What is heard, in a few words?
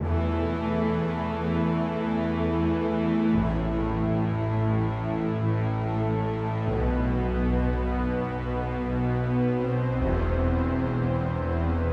Orchestral Brass E 144